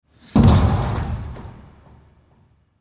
Hockey Check, Hockey Check Into Boards Glass Body Slam; SPORTS; Blastwave